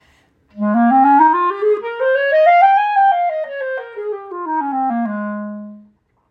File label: Clarinet Ab scale